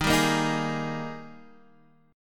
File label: G sharp Major 7th Suspended 2nd